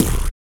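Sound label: vox percussion, Vocal Planet Jazz FX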